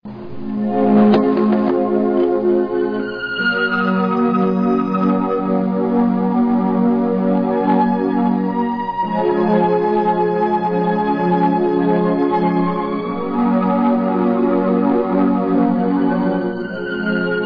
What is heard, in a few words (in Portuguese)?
orgao